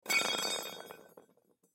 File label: Metal Rod Roll Movement Pipe; Materials; Blastwave; Foley